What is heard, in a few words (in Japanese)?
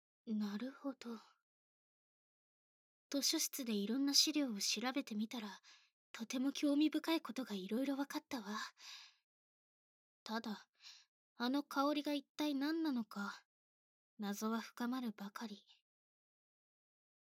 耳舐め; 分からないことがあれば実験すればいい…答えが出るまで耳舐め人体実験！